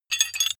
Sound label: metal handling pieces